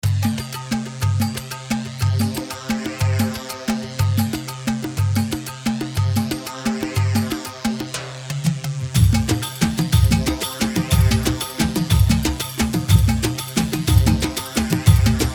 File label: Settati 3 4 182; Setati 3/4 182 ستاتي